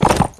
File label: horse canter; Mount&Blade Warband